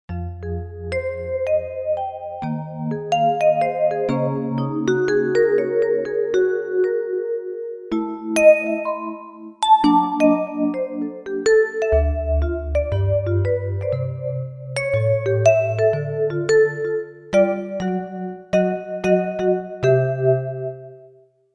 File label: Warm Vibe; Factory Patches